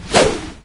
attack no vo, wraith; doom3

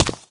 footstep, wraith; doom3

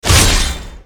large weapon pullout

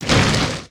drag

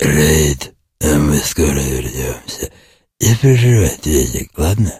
zombie idle

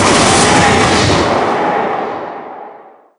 teleport in; doom3

